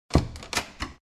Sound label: Home wooden door opening